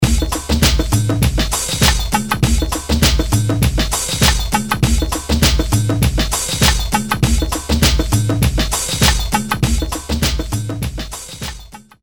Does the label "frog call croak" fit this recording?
No